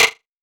Dirt, Hit; Sonic Foundry (Sony Creative Software) - Future Retro Dance Excursions

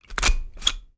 PUNCHER, STATIONERY; AKAI S-Series CD-ROM Sound Library VOL-6

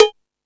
Ambient Rock, drum machine

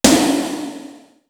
FRINGE, TOM; East West - Global Sounds; D4 BD SD TOM

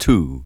VOX GENERAL; Megabass Remix! 2

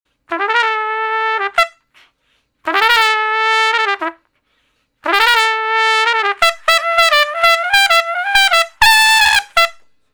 Trumpet Straight (E); Solos

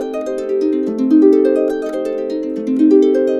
70, Complete Harps; USB Soundscan vol.62; AKAI; KUBLA 70

